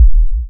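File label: waveform FM sine sine envelope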